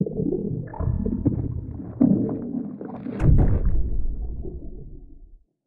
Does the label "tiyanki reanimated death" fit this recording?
Yes